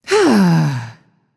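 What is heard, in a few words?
sigh, female voice; smite; voicepack; hera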